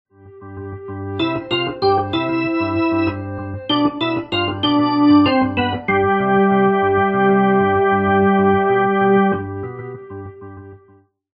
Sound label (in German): orgel test